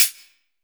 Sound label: METAL HHC, DRUM KIT; AKAI S-Series CD-ROM Sound Library VOL-4